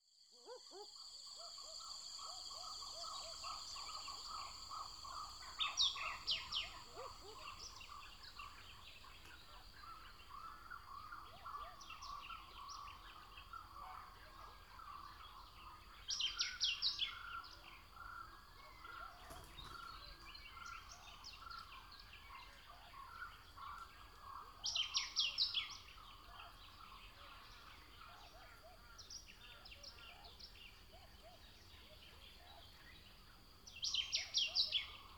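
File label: nature sounds bird singing by the pond; zvuki prirody penie ptic u pruda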